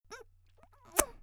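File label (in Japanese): （少女）口を離す音声付き; 元気な少女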